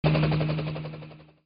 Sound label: Helicopter